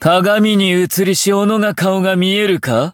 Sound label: rage; ff2; havoc blackcell